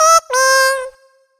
Pikmin Greet; Pikmin 2

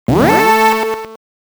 Warning; pop n bounce; arcade

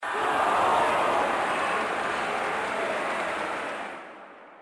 Crowd Oh; Hanger Rope Swing; android ios gamerip 2012

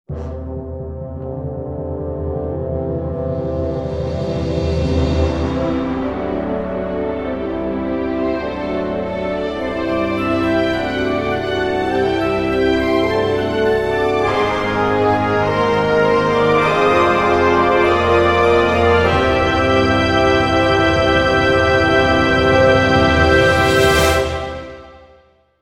Win Mission; Lords of EverQuest

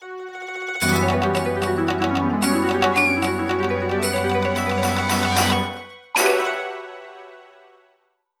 Jingle, Boss Clear; Sakura Samurai Art of the Sword; Wii

